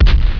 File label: BUL FIRE